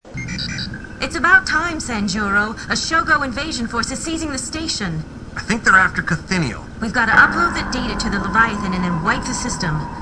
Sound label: hehe; shogo